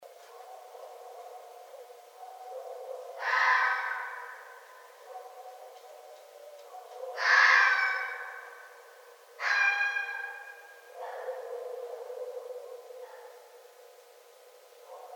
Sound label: Red Fox